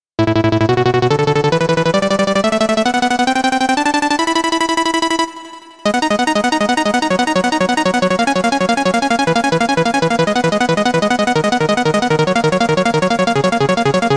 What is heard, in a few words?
arp fast action; the transmitter soundset